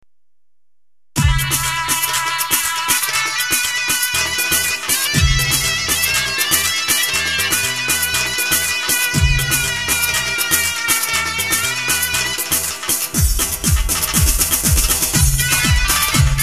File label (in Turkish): tekno halay, roland gw 8